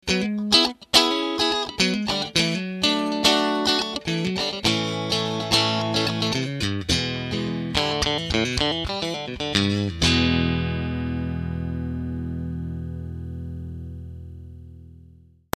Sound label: Boost Drive Full Fulldrive